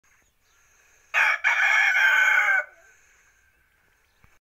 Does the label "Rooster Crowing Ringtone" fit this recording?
Yes